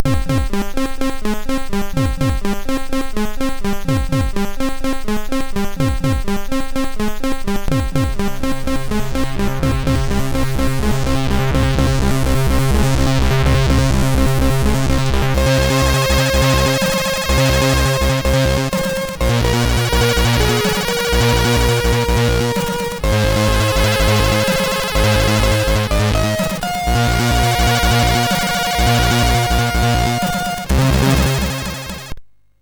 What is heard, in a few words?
Commercial Break sid MOS6581R3